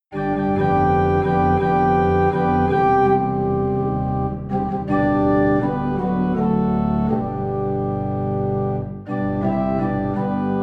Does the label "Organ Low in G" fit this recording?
Yes